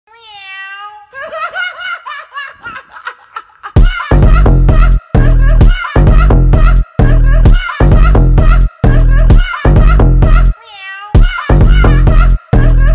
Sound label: Meow phonk